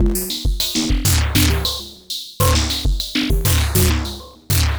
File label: Ratchet Beat